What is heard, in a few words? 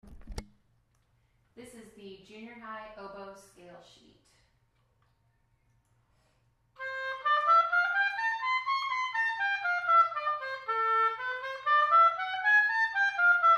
oboe scale